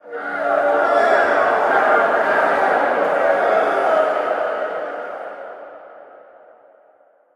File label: crowd wave, arena; ambient